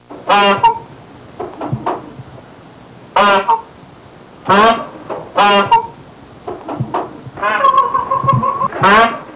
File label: Peacock sounds